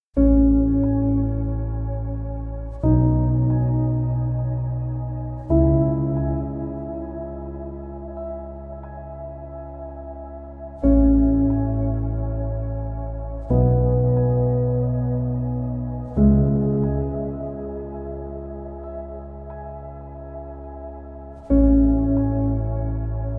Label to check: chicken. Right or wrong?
Wrong